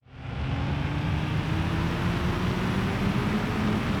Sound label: engine start, sci-fi sounds, mechanical